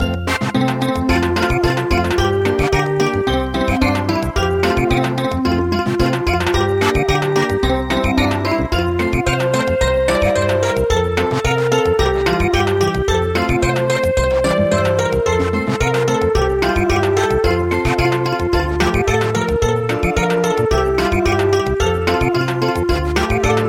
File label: Samba; El Rotlleto